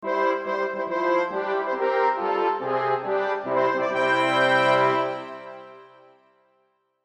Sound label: fanfare; Skurt RPG